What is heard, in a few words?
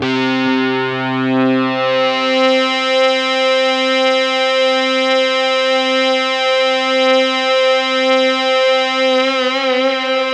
C2, Synth Line; NiceBeats